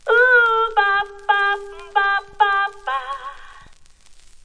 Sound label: 100 bpm Crackle Line; VOCAL OR VOXY LOOPS